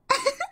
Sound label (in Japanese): うふふっ; 壺